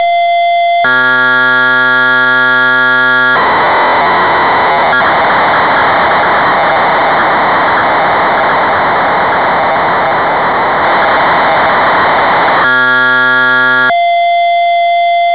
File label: Korg Poly 800 MK1 factory presets; Synthesizer Factory Patches; Cassette Interface